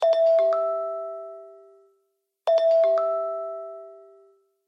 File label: bulletin ringtone iphone sms ringtones